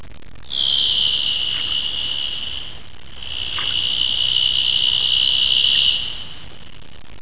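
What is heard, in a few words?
Leopard seal underwater FM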